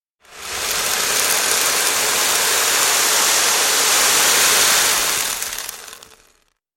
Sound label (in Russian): zvuki kofeinykh zeren